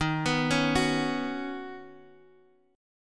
D sharp Minor 7th Sharp 5th D sharp, down, Guitar, Half Step Down